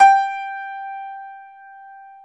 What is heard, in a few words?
G4 YAMAHA L, GRAND; NS GRAND